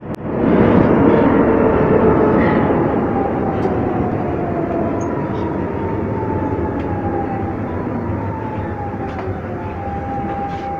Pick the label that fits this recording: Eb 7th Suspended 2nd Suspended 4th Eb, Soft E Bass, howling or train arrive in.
train arrive in